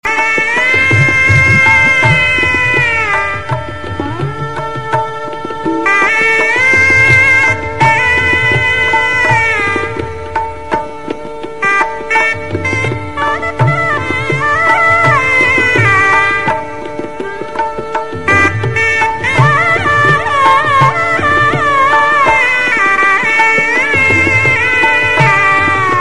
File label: Shehnai